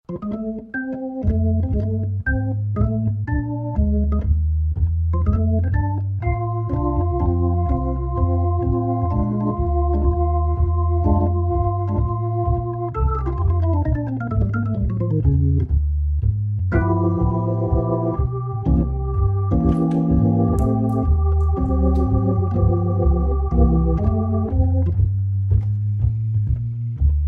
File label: Recording Hammond B3; Duet